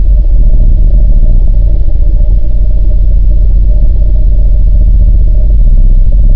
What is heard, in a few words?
alien move; svencoop